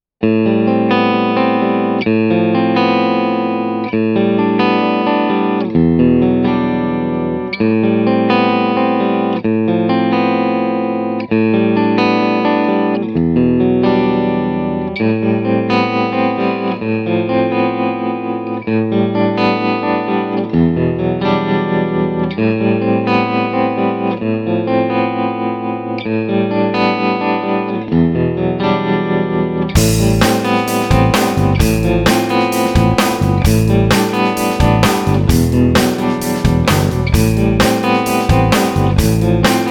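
clean vintage, Guitar Dry Sound – Guitar Wet Sound – Guitar + Band; USA Vintage ’62 Clean (Mic Pre Amp Tube Bruco); From 0″ Guitar Dry Sound – From 15″ Guitar Wet Sound – From 30″ Guitar + Band; Kemper Profile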